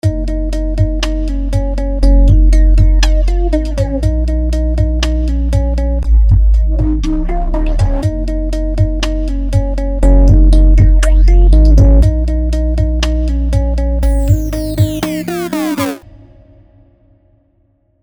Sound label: Classic Modulation FX; iZotope Stutter Edit 2